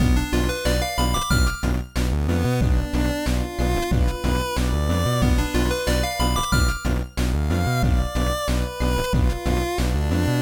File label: B D F sound